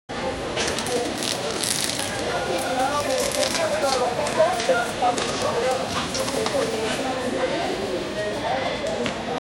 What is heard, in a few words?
grocery store bags and no, store